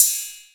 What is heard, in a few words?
open hihat; roost